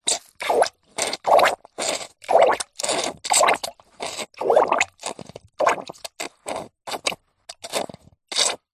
hospital syringe sucks and squirts squalches bowl water